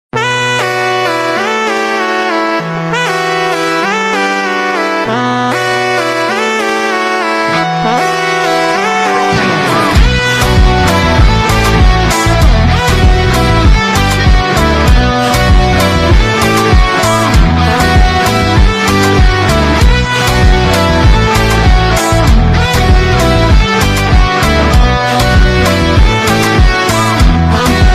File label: kompa funk guitar remix ringtone